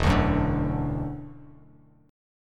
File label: E 7th Suspended 2nd, down, Bass Standard